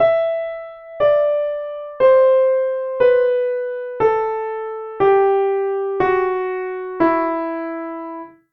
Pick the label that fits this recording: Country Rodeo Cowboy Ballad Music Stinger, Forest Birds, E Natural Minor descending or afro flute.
E Natural Minor descending